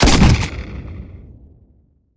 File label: fire, weapons